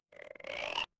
animal frog

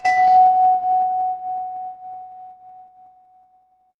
instrument chime bar

